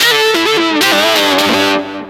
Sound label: guitar solo